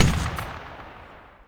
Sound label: 50cal ringout; WAR